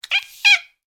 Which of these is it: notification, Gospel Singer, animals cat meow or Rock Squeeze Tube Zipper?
animals cat meow